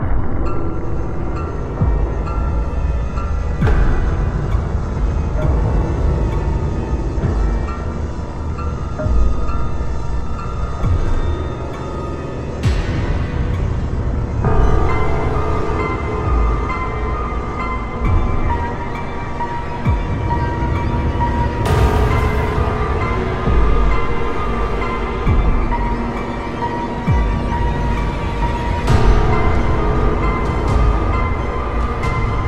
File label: The Thriller, fear